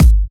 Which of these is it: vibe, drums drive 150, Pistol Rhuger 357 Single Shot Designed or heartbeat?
heartbeat